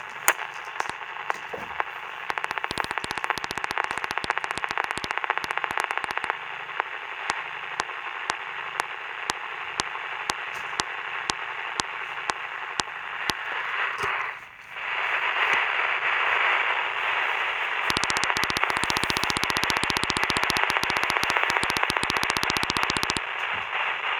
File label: triac noise; 트라이액 반응과 노이즈